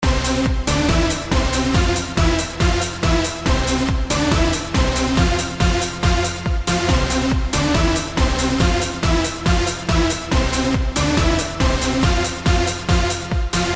hardstyle

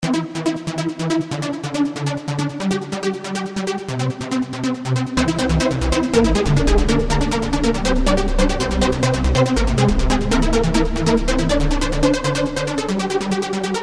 Blip

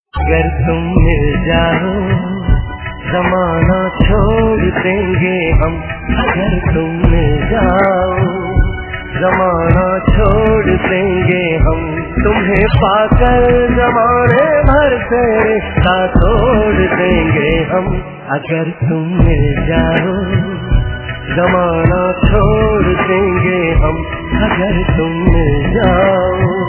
Ringtone

